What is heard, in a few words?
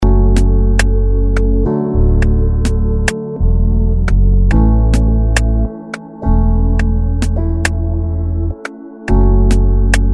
TYPE BEAT